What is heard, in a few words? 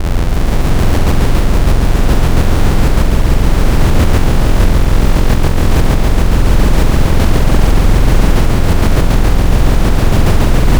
Spectral, wavetables; Architecture 32